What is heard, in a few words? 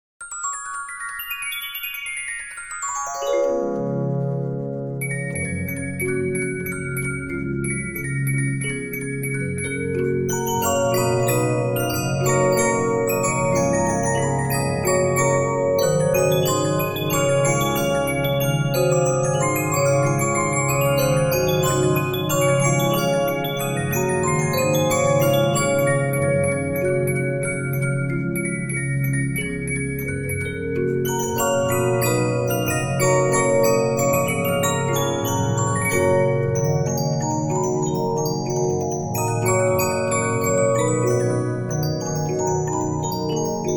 Regina, 15inch disc musicbox